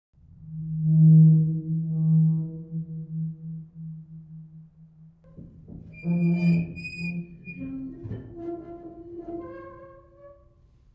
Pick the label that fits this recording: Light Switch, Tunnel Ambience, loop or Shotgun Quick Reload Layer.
Tunnel Ambience